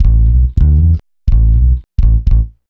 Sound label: B-LOOP